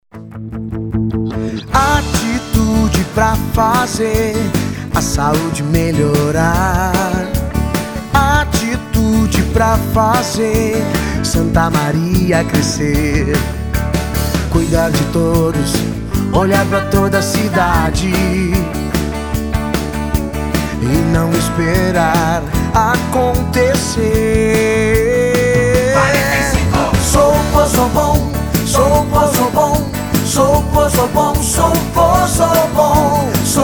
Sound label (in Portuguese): JINGLE